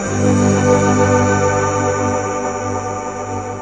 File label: uplift synth; Pads